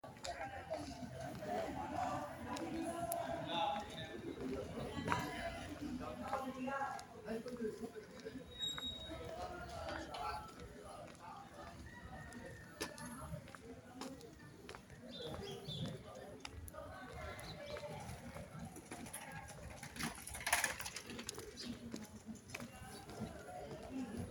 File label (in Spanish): Caimito despierta, paisajes